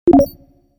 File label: Graphite Notification; HTC 10 - Sense 8 Sound Suite